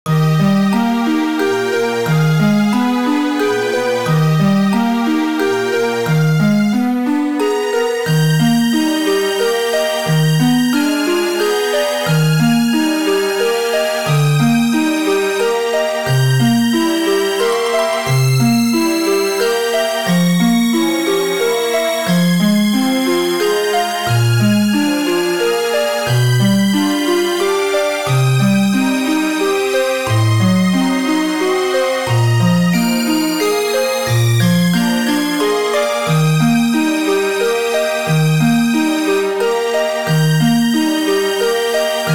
Waltz

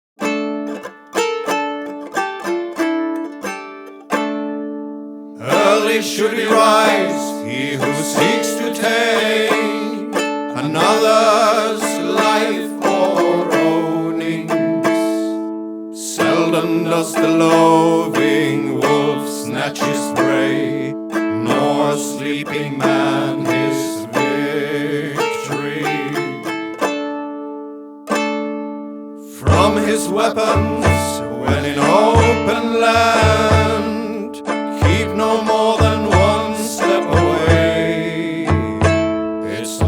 kitara drum vocal chorus

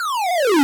Powerup-Platformer Jump Rev

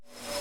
Void Suck

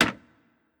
Boat Man Wood; Legend of Keepers (gamerip) (2019)

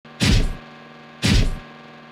Walk

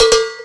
Sfx Winter games

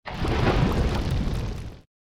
Falling In Lava

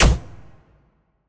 Turrets Shot

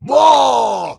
Pirate Barrel

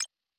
Command Move

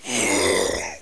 Skeleton Breathe

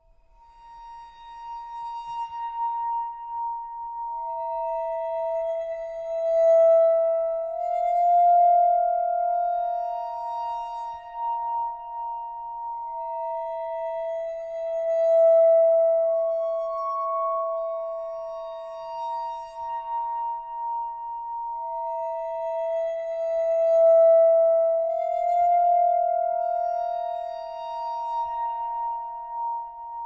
Combat loop Bowed Glockenspiel; Combat-loop-Bowed-Glockenspiel-A; curious expedition 2 macos ps4 switch windows xbox one xbox series xs gamerip 2021

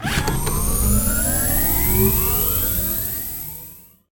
spaceship fly Away

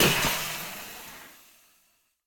Pod Panel Open; viewfinder; Intro Vf-Pod; Open-First

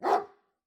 Dog Doberman

